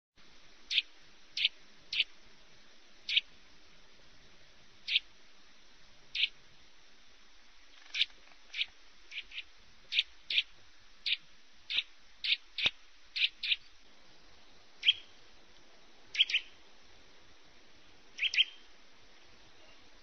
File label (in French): sittelle torchepot